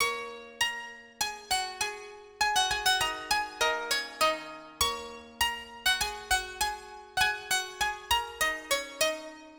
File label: Synth